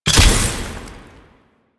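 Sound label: Invisibility Projectile Activate; Warframe; Quests; The New War; Drifter; Abilities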